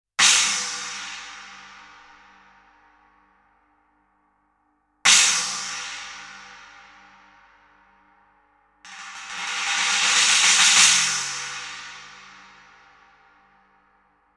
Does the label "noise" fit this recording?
No